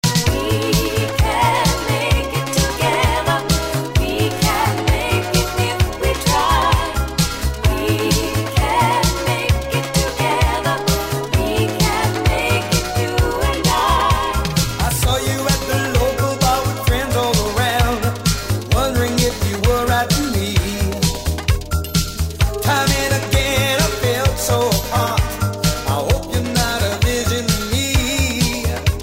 Italodisco 80s

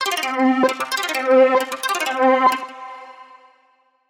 Minimal

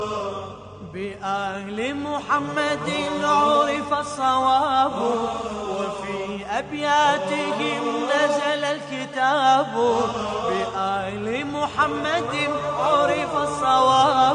islamic

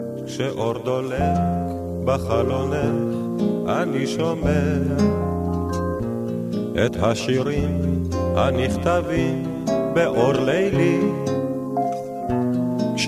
Romantic

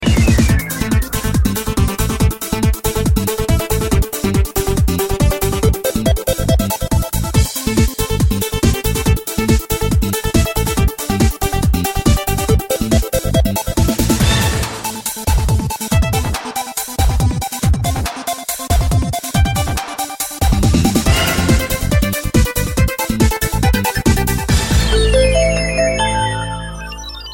Fast Digital Tone

